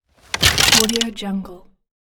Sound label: golf bag throw